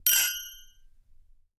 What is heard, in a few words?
Cheers; LOOPERS